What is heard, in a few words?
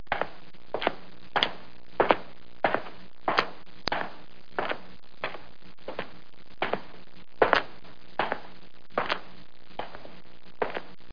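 footsteps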